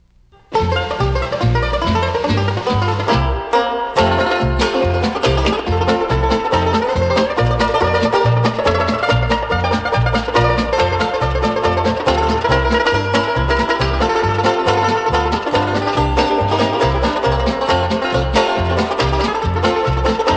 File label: bells